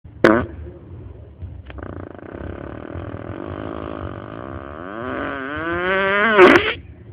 pedo

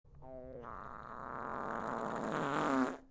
Ronquido

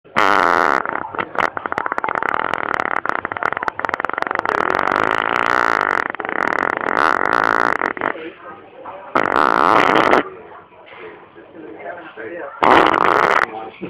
Pedo de fraga

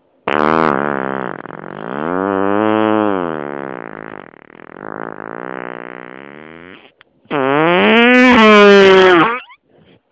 Pedo tasación

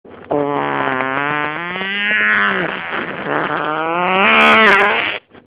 Pedo motosierra

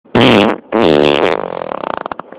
Se cala el poderío, pedo

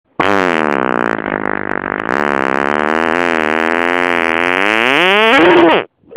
Pedo finale

